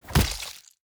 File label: Siege Impact Creature Small